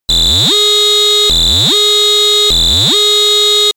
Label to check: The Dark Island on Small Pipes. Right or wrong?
Wrong